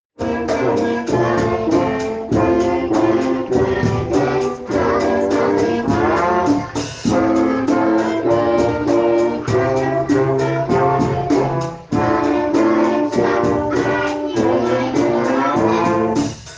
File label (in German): Blaskapelle